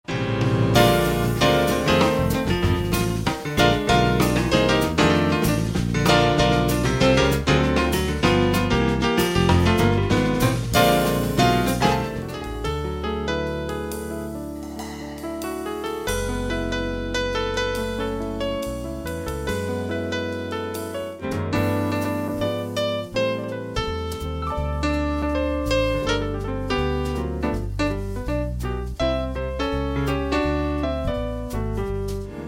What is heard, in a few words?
drum trio